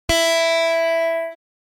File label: Electric guitar heavy E high string single pluck